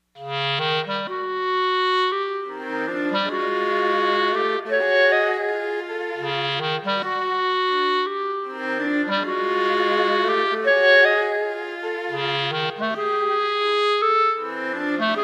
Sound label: blues for clarinet violin and viola